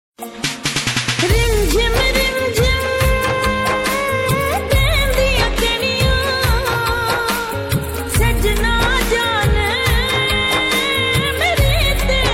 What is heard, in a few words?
Sad Punjabi Ringtone